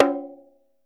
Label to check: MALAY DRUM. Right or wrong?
Right